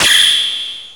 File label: PERC FX, SYNTH PER; AKAI S6000 CD-ROM - Volume 1; PERCUSSION